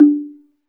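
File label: SLITDRM D4 P, slit drum; SLIT DRM1; Heart of Africa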